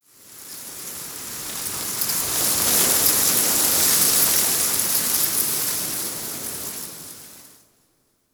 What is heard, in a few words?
Forest Wind Soft Leaf Gust, Ambience; Warframe; Grineer